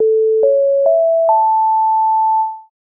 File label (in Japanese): お知らせ 始まり; 戏剧音效